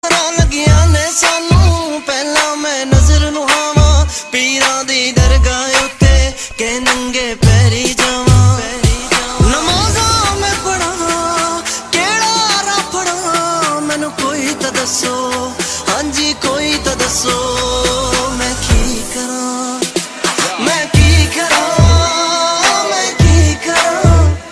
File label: sad ringtone